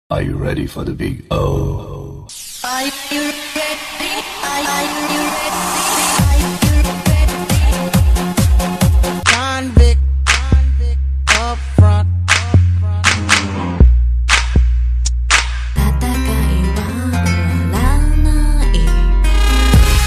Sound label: Thump Mix